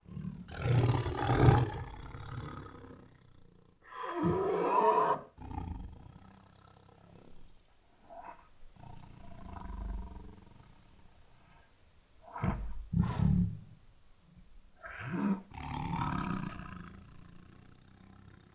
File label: Female Tiger Makes Many Protective Growls; Tigers; Sound Ideas